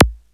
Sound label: ZAPP; ELECTRIC-SET